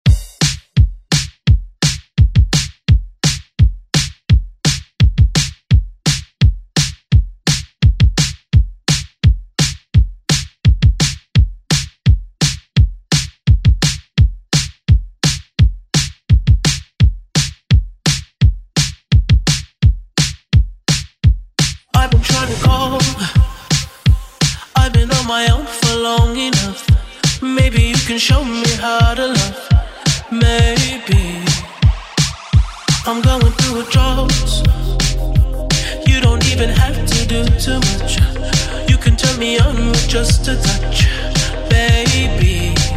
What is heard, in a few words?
Pop Redrum; Deejay Hit